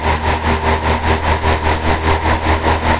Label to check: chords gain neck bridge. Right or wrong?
Wrong